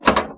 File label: Bumper